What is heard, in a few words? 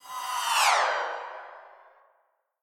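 Cymb Shwish, cymbal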